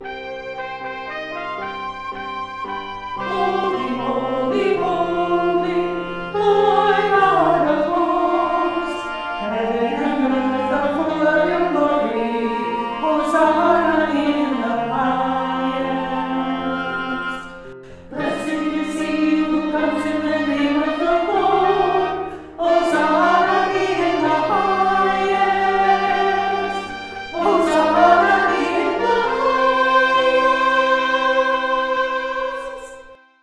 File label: Holy Holy melody; music ministry